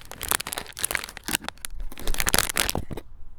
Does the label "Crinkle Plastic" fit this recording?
Yes